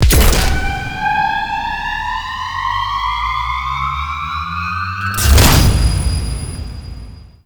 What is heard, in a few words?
Ordinance Charged; Warframe; Wraith Quest